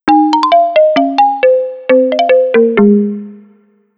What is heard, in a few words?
Happy, ringtones, Gigaset; GS290